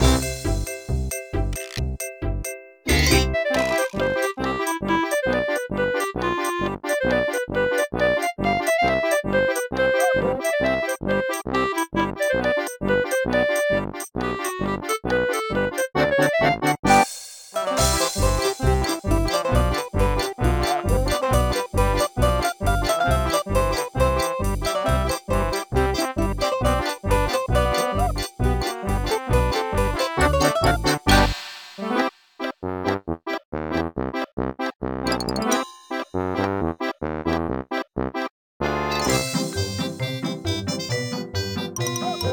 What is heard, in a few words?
party polka dance